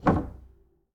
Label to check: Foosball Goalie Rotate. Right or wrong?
Right